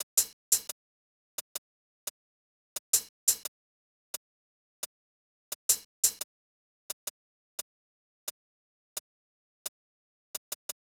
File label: Hats, 087 Bmin, Drum Tracks; Hip Hop; big fish audio; Hip Hop Exotica; Apple Loops